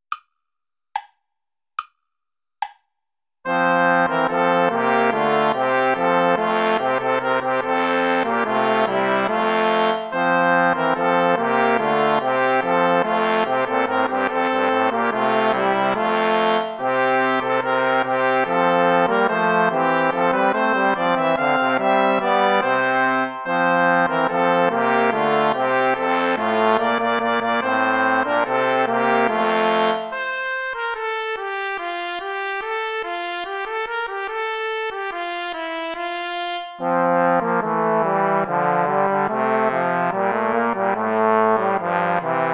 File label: play along without trumpet; schma ckt den saal mit gra nen zweigen